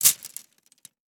Sports Pole Plastic Tent Poles Dropping On Dirt

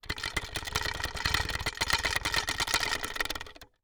Foley Metal Latch Rattles

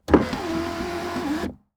Saturn Open Sunroof Interior; Auto; Transportation

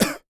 Human Male Cough Single

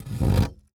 Sword Scrape Thin Wood, weapons; AdobeCC

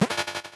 Science Fiction Sci-Fi Electronic Emergency light

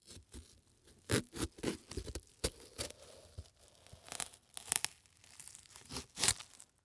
Horror Scary Head Cut In Half With Knife Slowly

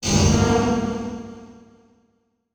Transition Metallic Blast

